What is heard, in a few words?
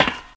grate; firearms